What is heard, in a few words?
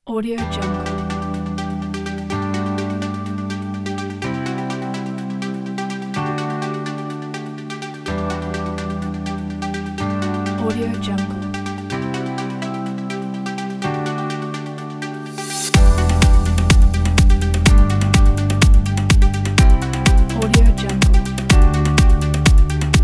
fashion house